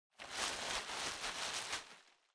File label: Bubble Wrap Rustle; 気泡緩衝材; ガサガサ; 梱包材